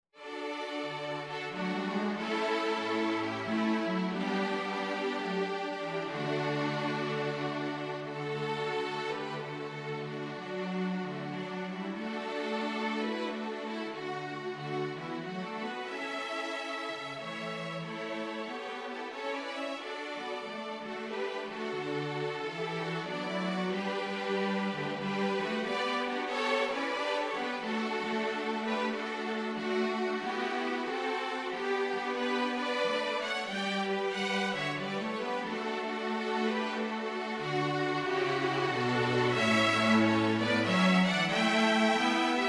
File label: Jazz String, Jazz Carol For String Quartet; Silent Night